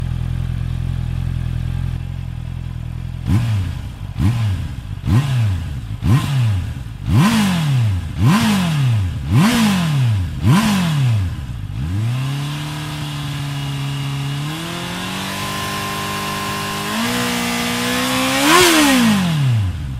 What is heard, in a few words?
honda cbr650r